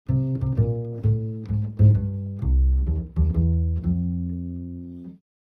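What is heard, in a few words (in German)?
triolische vorschläge skips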